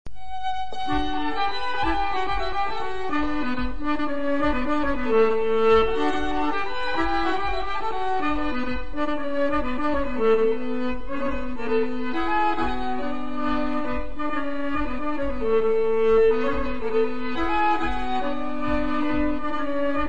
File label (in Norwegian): Graavaspringar